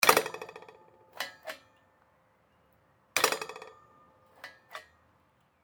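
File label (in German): Metalltuer oeffnen schliessen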